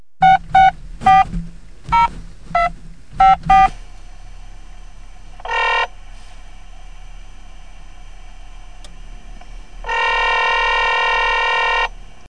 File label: phone